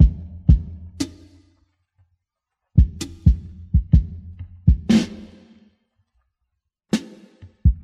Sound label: sparse, Boombap Drum loops